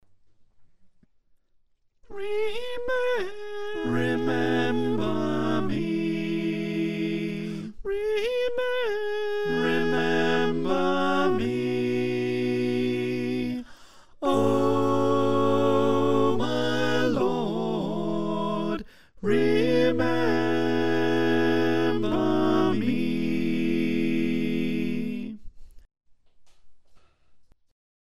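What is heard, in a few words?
soprano alto tenor bass, choir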